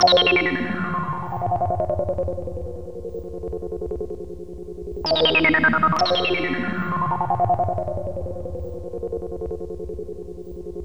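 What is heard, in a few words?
synth, Drum & Bass; Best Service ProSamples vol.03 - Drum & Bass 1; AKAI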